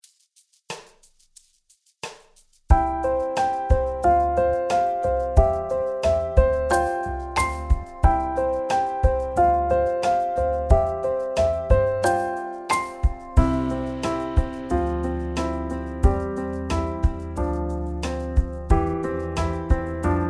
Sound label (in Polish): NIEPODLEGŁOŚCI DZIEŃ (WERSJA INSTRUMENTALNA)